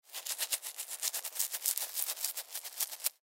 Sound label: Human Scratch, Scratch Beard; Blastwave